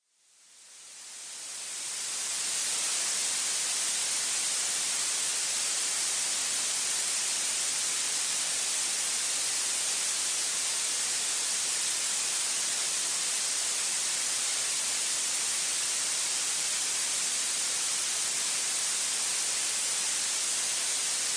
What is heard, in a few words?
Blue Noise